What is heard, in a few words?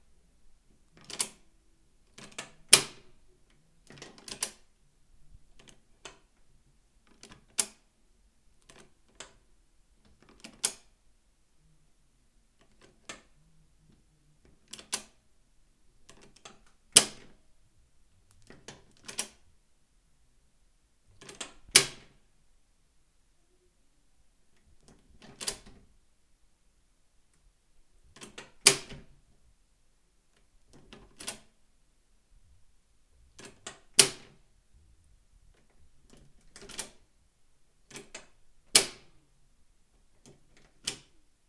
Deadbolt Lock